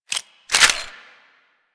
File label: Reload, Weapons; Warframe; Tenno; Miter